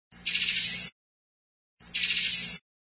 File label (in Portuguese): canto bicudo